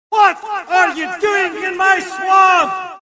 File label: swamp; assassin m4a1